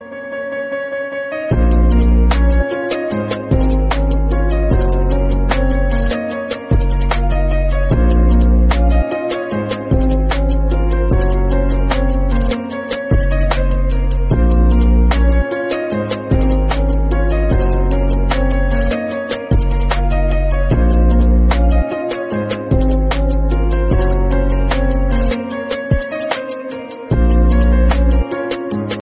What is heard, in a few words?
soft mix music mobile tone ringtone; iphone ringtones